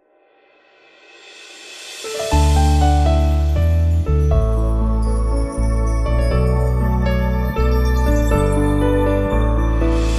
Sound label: song; eng